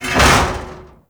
Mech Head Impact; Nechro

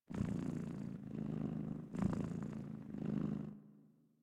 Kitten Purr; Enemies; Warframe; Catbrow

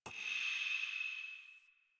Stone Small Scratches

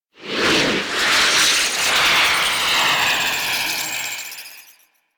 Thermal Shock Ring; Warframe; Runner

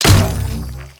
Mushroom Bounce Impact; Warframe; Nokko

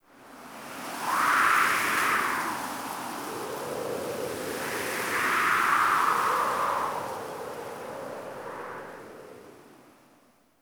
Wind Gust, Camp; Quests; The New War; Ambience; Drifter Camp

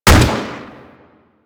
Rifle Shot, Weapons; Drake

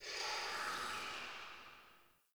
cavernous water lap rock distant; Ambience; Dungeons; Under City

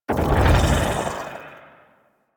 Void Enter; Powers; Warframe; Focus; Operators; Wraith

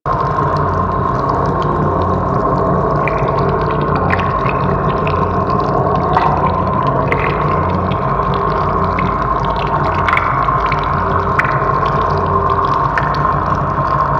Water Pump Water Trickle Loop; Ambience; Props; Warframe; Grineer Forest

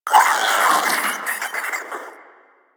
Proto Infested Maggot Awake, Vocals; Warframe

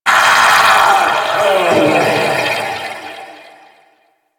Vocal Death; Warframe; Duviri; Dax; Vocal Set A

